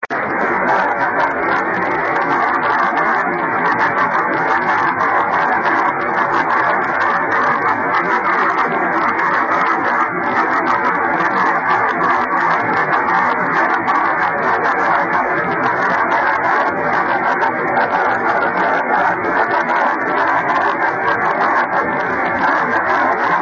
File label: 24G beacon; 雨、気温８℃ 湿度８０％; SSB:RST535 ANT:六本木EL4° 60cm dish 受信：ＤＤ対応機 レインスキャッタ; JH1UGF 横浜市; JN1AYV 茅ヶ崎市